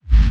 BD Gate FX, Bassdrums; Vengeance Samples

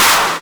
Clap; Vengeance Samples; VEC1 Claps

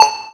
Synth Sound; Vengeance Samples; VEE Synth Shots

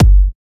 Kick; Vengeance Samples; Knight Kit

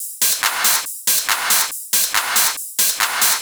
Loop 140 BPM; vengeance essential club sounds-3

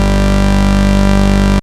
Synth Cuts; Vengeance Samples; VES1 Instruments

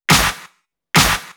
Clap, 140 BPM; Pitched; Vengeance Samples; Vocal-Hook Kits; Song Kit; Break The Walls

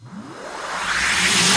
Sweeps Medium

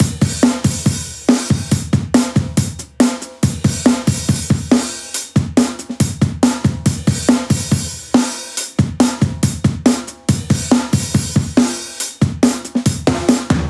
Breakbeat 140 BPM

